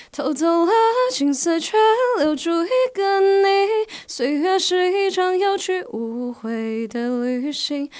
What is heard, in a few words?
Alto